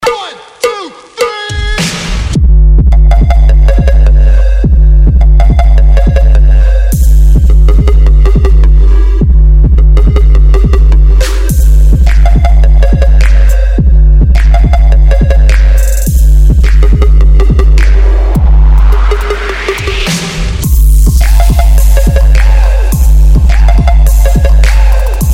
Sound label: Trap Mega Bass